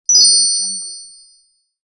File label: success; alexm76